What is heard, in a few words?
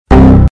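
error windows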